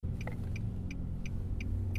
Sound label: car blinker